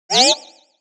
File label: Anime warning accent